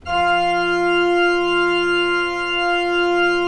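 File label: ORG F2, KEYS; Synclavier Sampler Library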